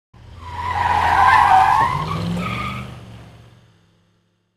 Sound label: Car tire skid pass by